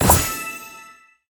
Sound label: Chest Drop; boom karts multiplayer kart racing android